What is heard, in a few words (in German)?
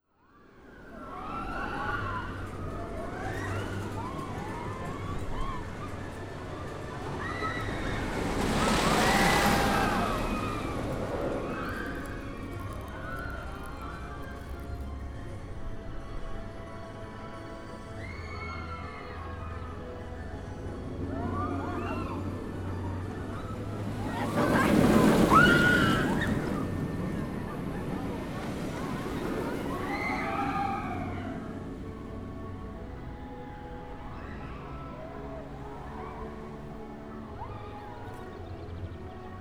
Blue Fire Kurve nach Looping